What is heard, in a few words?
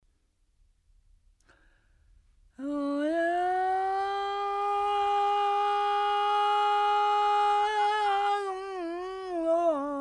Stong skor Folk Song